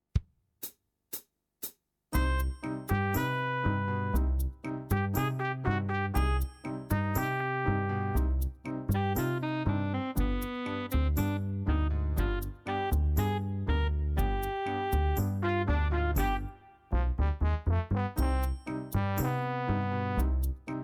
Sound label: latino minus drums